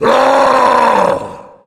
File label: tank yell, voice yell; source engine; left4dead2